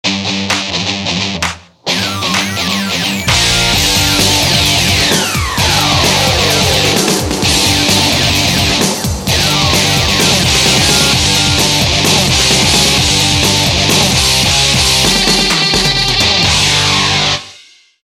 metal; Motorola; E790

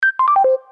call disconnect; Samsung SGH-T369 Linx

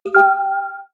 Beacon, notifications; Xiaomi Black Shark

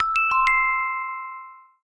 Bell, notifications; Honor; MagicOS 7.1

